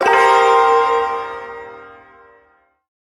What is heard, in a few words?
Shawzin Blues Chord; Blues Chord K; Warframe; Duviri Ersatz Shawzin; Chords